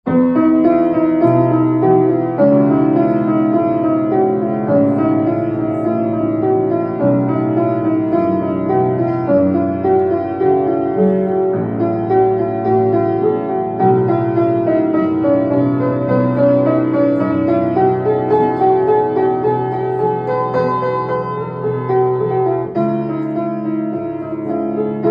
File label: Piano